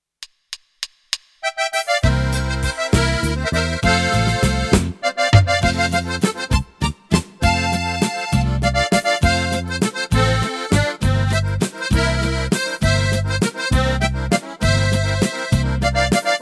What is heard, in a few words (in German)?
Laendler mit Melodie